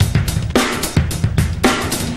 blockbuster, Drum loops Boombap

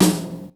airport, snares real

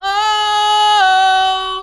"oh oh" female SUNG; ooh ahh

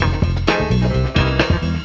piano; Phonky

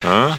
"huh"; Voice; ooh ahh

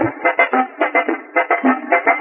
keyboard, drum loops

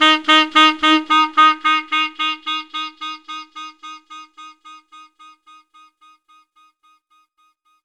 cubby sax

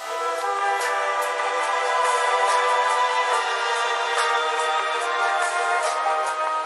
flute summa brees, Loops, Jazzmatazz

